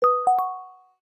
Greetings, notifications; LG Wing 5G Verizon

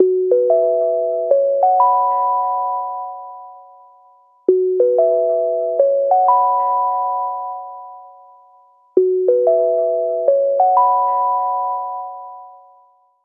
ringtone bubbles; The Light Phone II

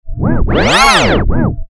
Vinyl Slide; Sony Ericsson; W595; PlusFourSix; Lars Edvall

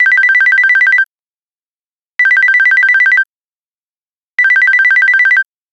Beep, ringtones; China Telecom

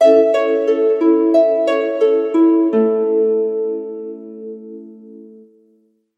Melodic Mirth, bell evening reminder; Mudita Pure

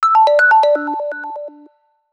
Shimmer alert; Sony Ericsson; PlusFourSix; SE Sound Project; Lars Edvall

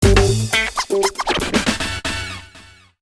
Hiphop message

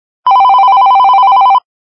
Melody, ringtones

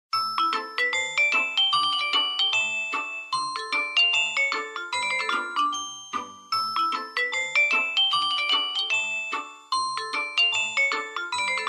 Samsung phone tone; SGH-Z730; Mediterranean Stroll

